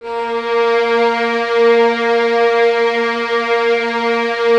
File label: A#3, String Ensembles Violins; Miroslav Vitous; 11 Violins